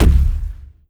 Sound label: bass soft, zombie blind step; Half Life Alyx; creatures